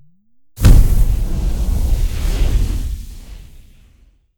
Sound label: Flam Eater Flame